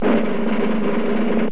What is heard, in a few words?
musical